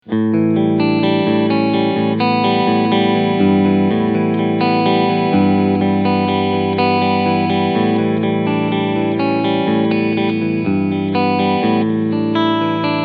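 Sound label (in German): PRS S2 McCarty 594 Overdrive beide PUs Clean SC Modus, E-Gitarre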